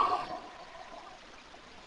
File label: laughter